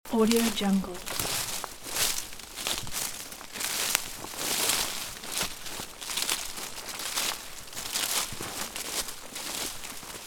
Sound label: wading through dry undergrowth in woods loop; راه رفتن میان شاخ و بر; human sounds